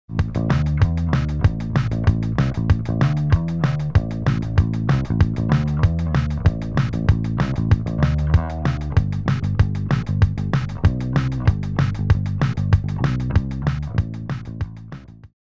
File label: Bass lick